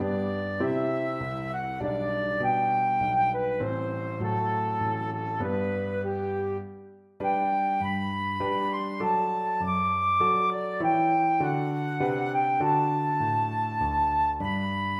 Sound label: sarabande, flute